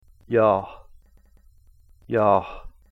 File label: yaah